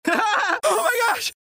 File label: power; Cartoon Network - Toon Cup 2020; badgerclops